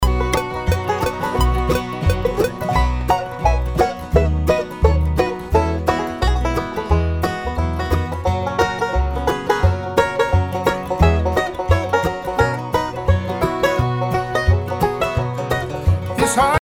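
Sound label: banjo solo, original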